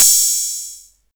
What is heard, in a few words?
HiHat Open, Boss Dr Rhythm110; Drums